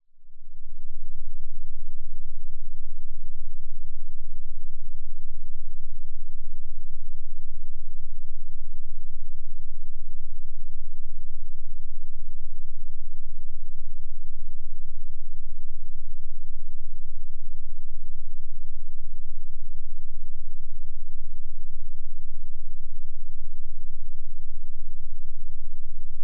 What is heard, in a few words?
8Hz; 8.176; C₀; Subcontra; C₋₁